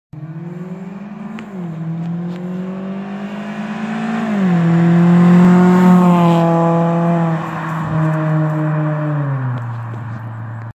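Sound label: Forte Koup, اگزوز; سراتو کوپه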